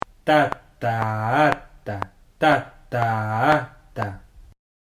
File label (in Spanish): sincopa ejercicio, Syncopation